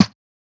bird snare

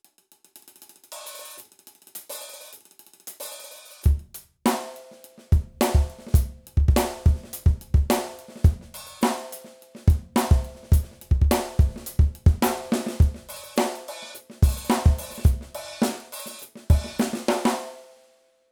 aerodrums

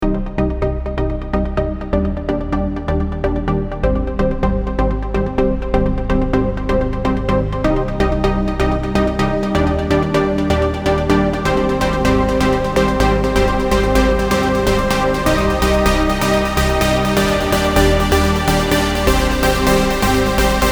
soft lead; ana modern

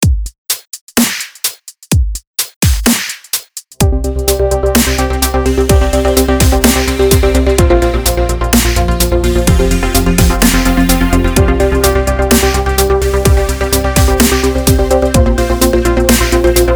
rise

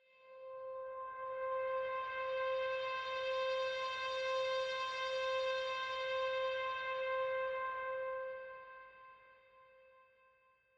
aetheric solo pad

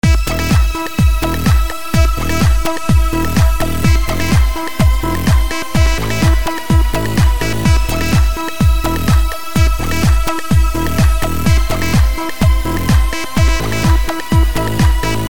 chill prog house

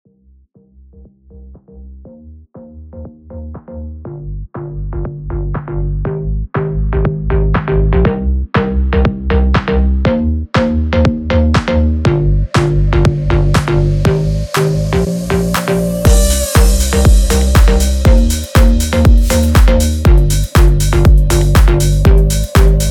warm melodic house

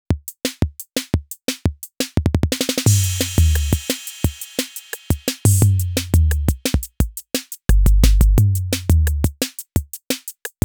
tom2 mono

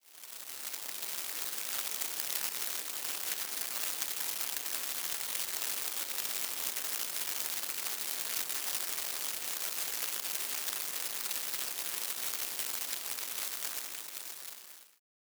heavy rain

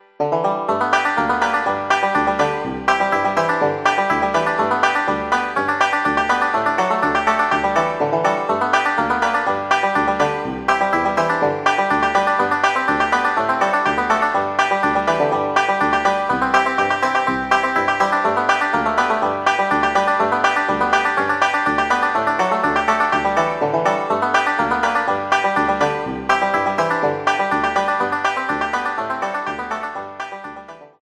tenor banjo

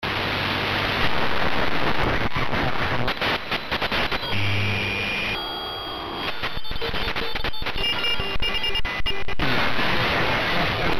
vintage 4 band radio fm

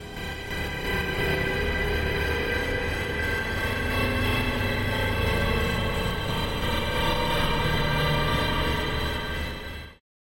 atmo serial madness

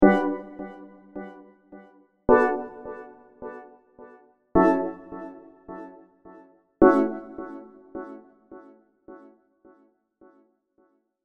serum chords; chiqui audio

